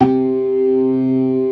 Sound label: ORGAN SYNTH; InVision Interactive; Keith Emerson